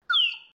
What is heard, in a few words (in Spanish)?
flauta